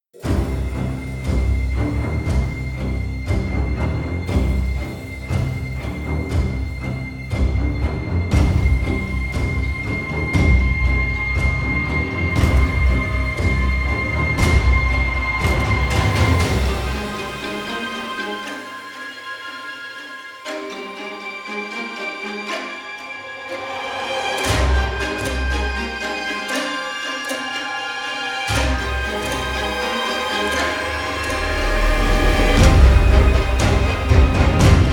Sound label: Strings only; The Northern Frontier